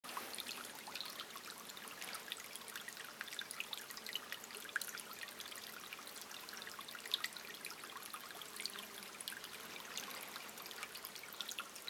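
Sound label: Potok, stream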